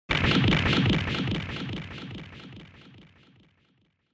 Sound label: Fracture Delay; 骨折; ディレイ